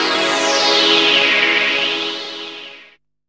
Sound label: DR. LOOP, TRANCE; X-Static Goldmine 3